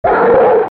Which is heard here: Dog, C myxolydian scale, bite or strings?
Dog